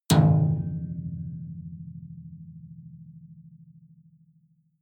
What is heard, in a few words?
metal impact piano string damped hard